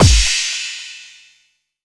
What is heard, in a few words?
BD, Dance Drums